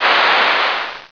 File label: steam